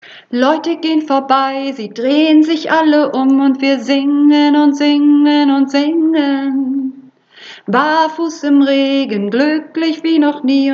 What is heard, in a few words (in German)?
Hohe Stimme, Uebungsaufnahme